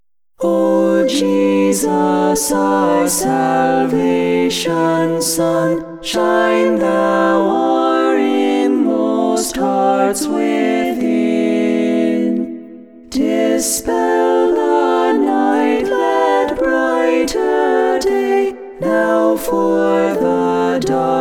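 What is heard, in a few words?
Top Voices Only, polyphony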